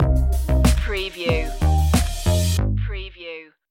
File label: bluestone streets stinger